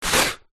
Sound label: human spit blow water spray